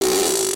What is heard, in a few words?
carrier fart